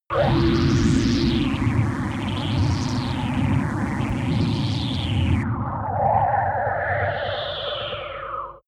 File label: scout shuttle, raumschiff